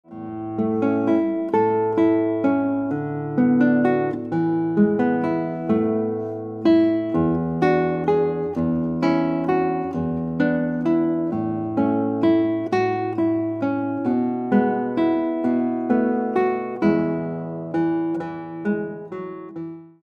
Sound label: Guitar